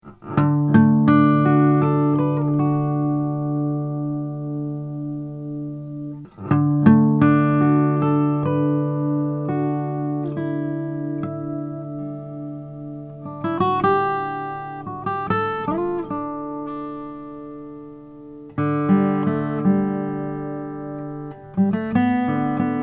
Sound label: kathy wingert royal macassar ebony